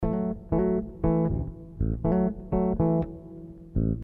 120 Sitarish

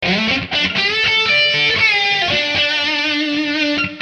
Dm 120 lead

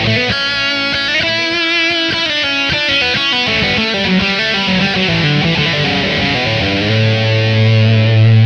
lead, 100

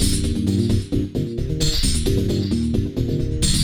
Funky 125-132 Cm7

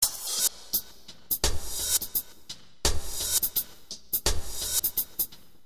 Brushed Tops

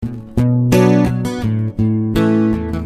Strummed Bm,A 86

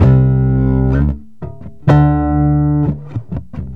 Bass Chords C, D 128

